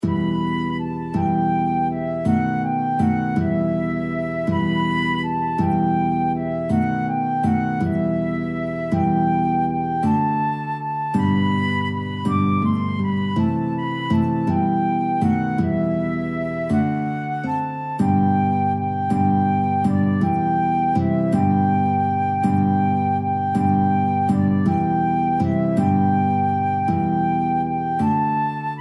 guitar flute duet

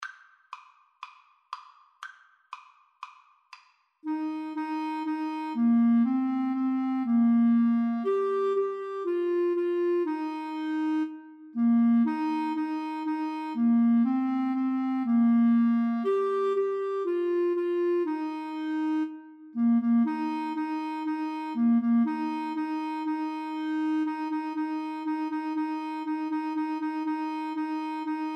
old macdonald Clarinet Duet

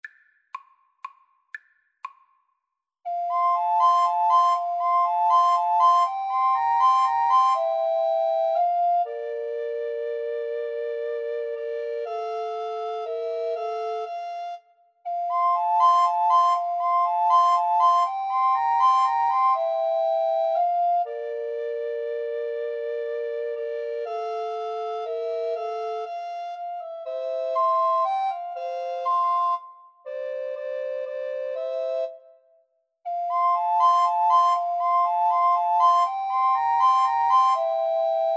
haydn minuet, Recorder Trio

